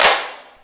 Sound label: CLAP, Big and Heavy Real Drum Kit; REAL LIVE KITS